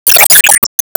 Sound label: Sigh